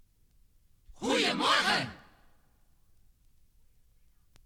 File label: topformat maxi jingle single